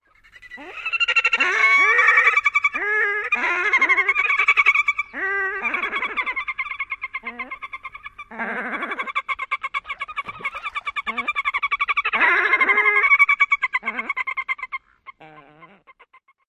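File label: RENEGR song, red-necked grebe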